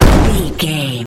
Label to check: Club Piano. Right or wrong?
Wrong